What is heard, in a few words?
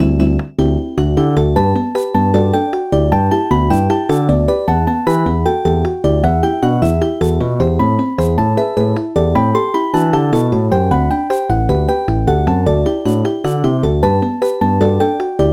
Flute; rips of christmas future 2017